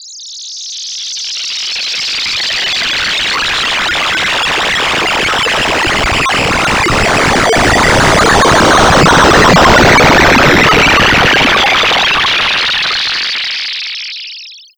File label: Space FX One-Shots; Rondo Brothers Trip-Hop Loops & Samples; Hooked On Hookers